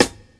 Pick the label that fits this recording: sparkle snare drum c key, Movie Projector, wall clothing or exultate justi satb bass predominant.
sparkle snare drum c key